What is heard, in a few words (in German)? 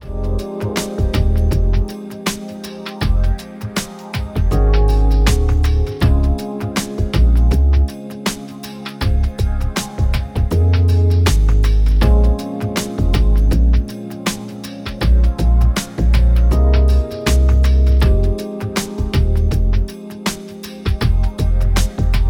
Background Musik; Loop